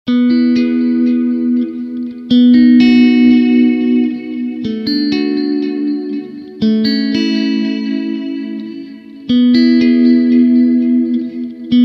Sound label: chimey sc echo